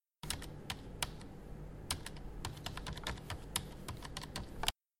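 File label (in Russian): клавиатуры компьютера в библиотеке; fraternitat v barselonete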